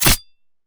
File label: paw